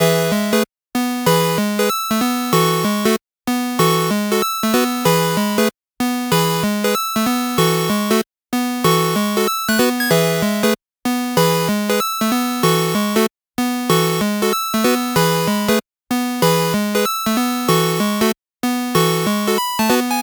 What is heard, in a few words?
Options Music; Bebop and Tempo